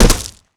land gravel, footsteps; player

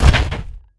dog footstep run; Half Life 2; Episode 1

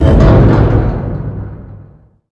dog gunship pickup; Half Life 2; Episode 1; intro

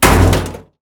metal sheet impact hard; physics

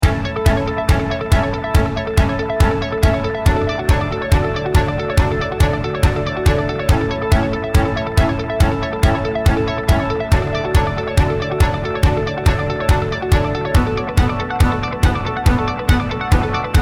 start round, music

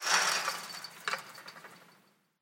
ambient chain link rattle, metal

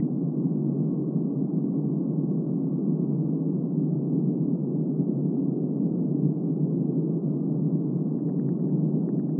interior; survival

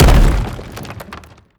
bash hard, brickwall, destruction

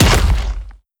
step; creatures; strider; seq passby

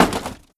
break plastic lid small; physics; breaking

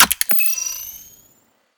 attach flashlight; player; inventory

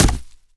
cardboard box impact hard; physics

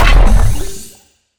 longfall land, footsteps; player; Portal 2

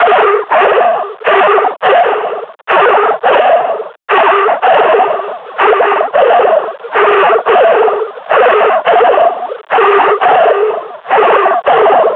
panting, combine grunt

